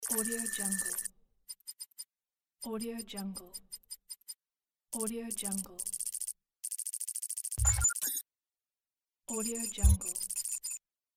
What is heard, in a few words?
digital text